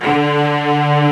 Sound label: ARCO STRGS M; OMI - Universe of Sounds